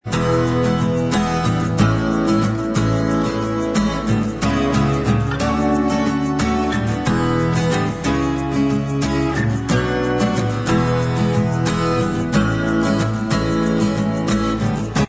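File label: acoustic version Instrumental Track, backing tracks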